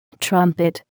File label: trumpet